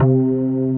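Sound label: synth Perc Layer; Best Service Gigapack 1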